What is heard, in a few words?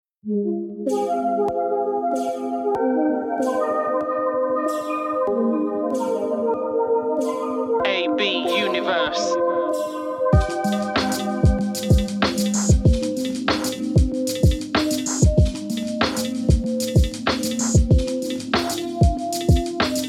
Conscious Type Beat 190 Bpm Instrumental